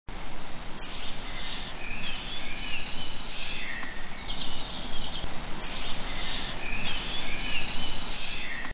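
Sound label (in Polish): jarząbek, hazel grouse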